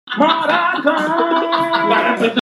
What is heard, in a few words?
maracas